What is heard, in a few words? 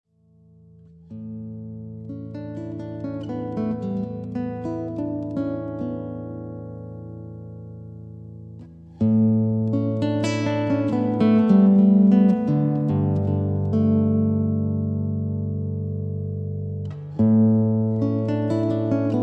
Ancestral Bells